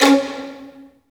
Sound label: STR, Roland LA Composer; STR ORC FX; Roland - LA Composer vol. 1